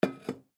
Teapot Metal Down, Teapot Metal Set Down Stove Top Antique Tea; Household; Teapot Metal Set Down Light Stove Top Antique Tea Kettle; Dishware